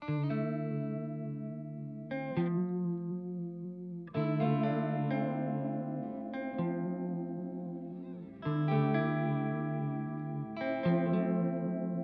Lethargy Gitarre; Dreadbox

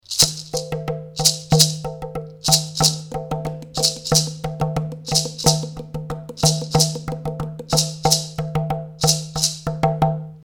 bissl einfache Percussion

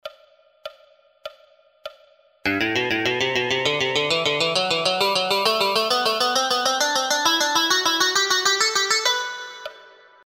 SCALES Dreiergruppe

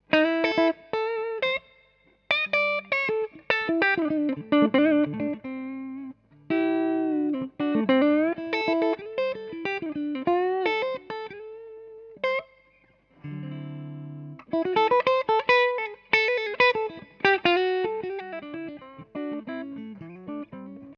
Hals PU Clean

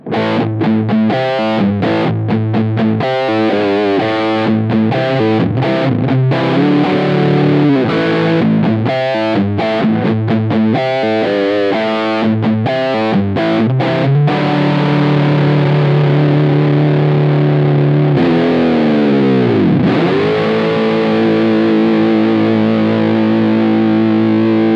Clean Amp Tone 9 Uhr Sustain voll

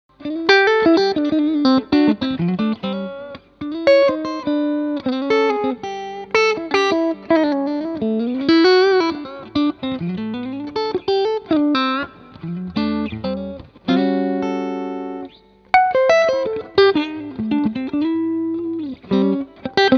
Fender Hot Rod IV Deluxe clean Hals Mitte

